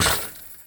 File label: Street Ball Glass Basket Chain; SFX Kit